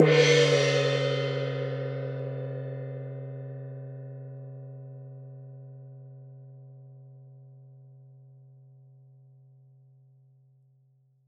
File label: Gong Large; World Percussion; Cymatics; Emperor World Percussion